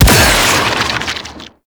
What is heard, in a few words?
Shot; Warframe; Weapons; Infested; Inf Sniper Rifle; Coda Sporothrix